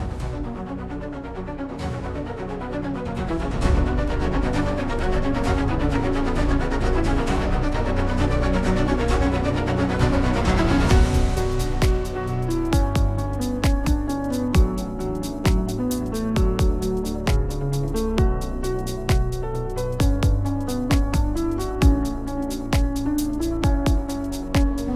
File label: instrumental